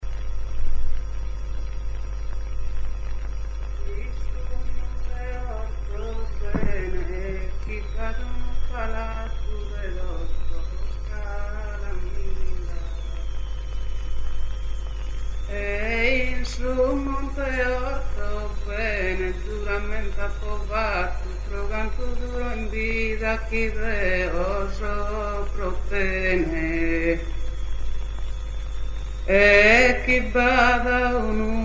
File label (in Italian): mutos orgosolo; canti monodici non accompagnati